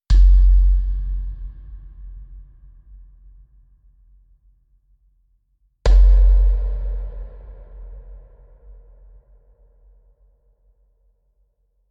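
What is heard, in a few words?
Indigenous Kicks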